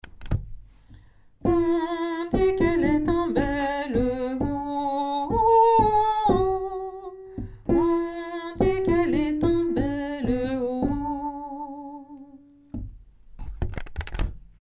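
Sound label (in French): la fille du laboureur alto; Montaigu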